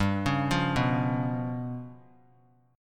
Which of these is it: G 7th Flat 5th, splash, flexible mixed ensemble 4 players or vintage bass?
G 7th Flat 5th